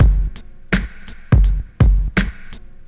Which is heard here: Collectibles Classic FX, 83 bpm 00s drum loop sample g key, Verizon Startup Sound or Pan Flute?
83 bpm 00s drum loop sample g key